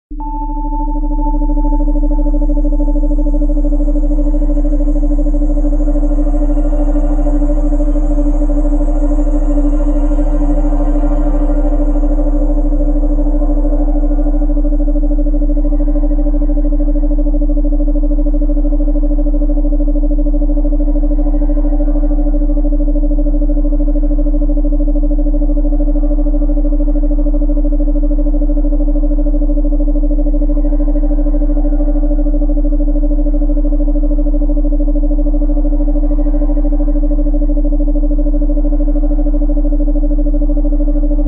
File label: 3rd eye binaural